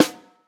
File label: high quality rich low end snare single hit D# key